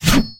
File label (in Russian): probiratsya v dzhungli razmahivaya machete